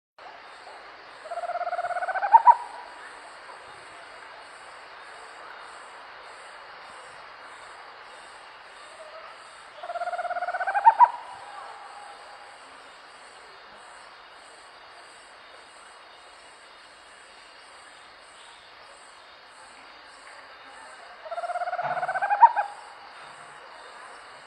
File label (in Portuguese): Canto da coruja do norte